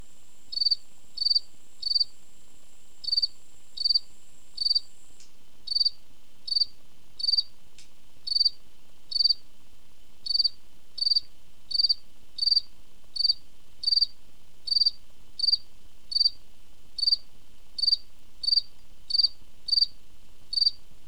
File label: fall field cricket; Insects